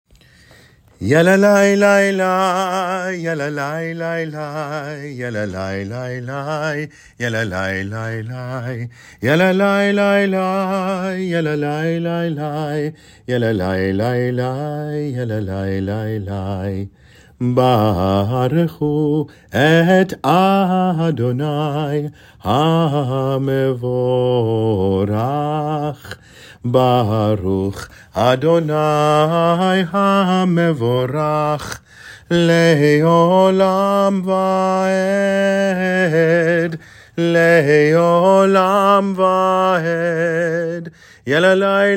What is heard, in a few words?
Acappella